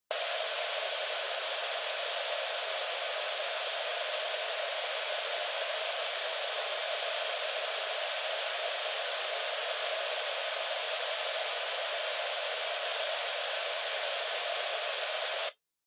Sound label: Handheld Transceiver Noise Long